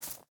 footstep burlap grass jump start; Rust

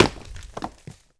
Hit Rock; Civ4 warlords

